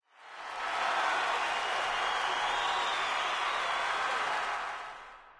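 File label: movement lobby victory crowd; Smite; Cabrakan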